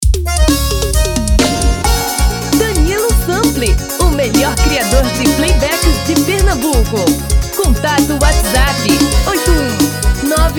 Forrozao

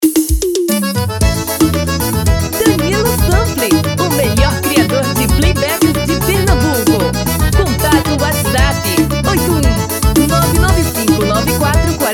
Forro

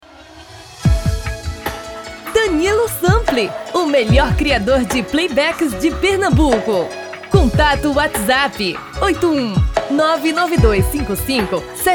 BREGA

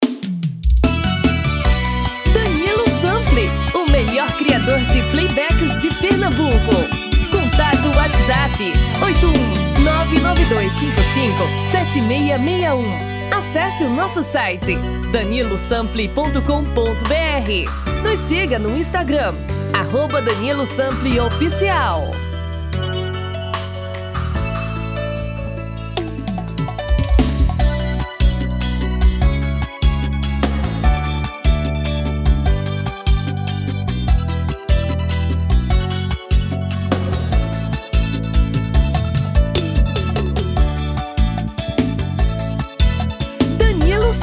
BREGA tom feminino

